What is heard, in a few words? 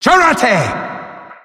staff magic